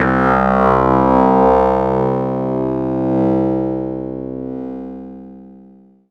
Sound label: syn, Chillout Synth Atmos